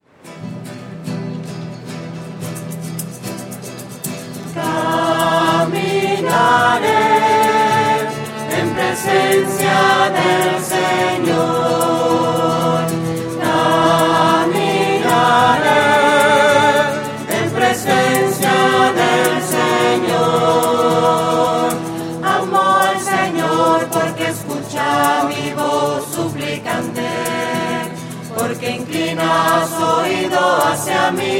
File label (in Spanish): caminaré voces